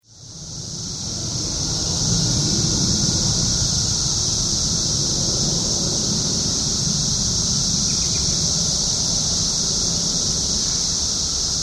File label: cicadas, 17yr cicada; Excerpts of the 17-year Cicada Brood XIII from Glenview, IL (2007)